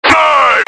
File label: chase; slender